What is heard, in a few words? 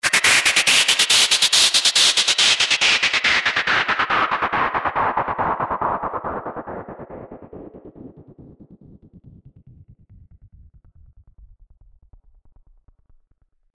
Pink Noise Effix Delayed; Techno